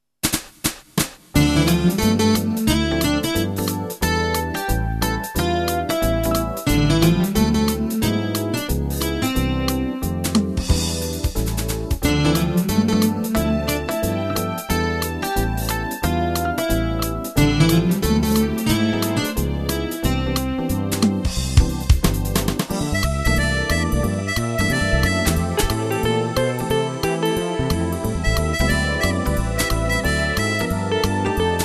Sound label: jazzz